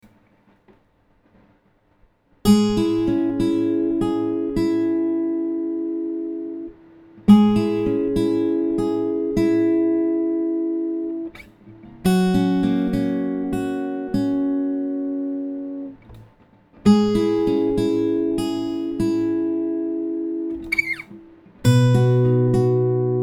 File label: C#m100 acoustic